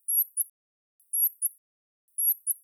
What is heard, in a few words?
rock wren contact call; conservation, native animals, birds, bird song